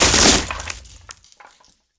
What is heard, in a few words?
Destroy Corridor; Game rip; MiSide